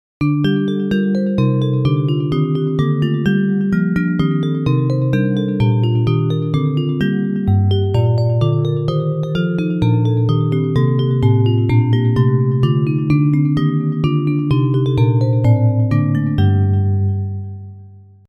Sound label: Bells